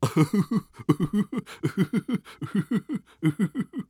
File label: Cartoon Human Male Giggle Hillbilly Giggle